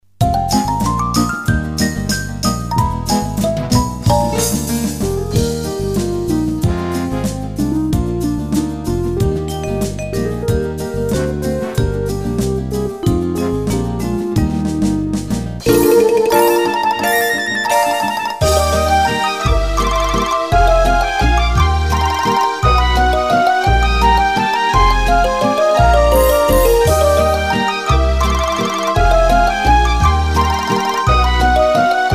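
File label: backing track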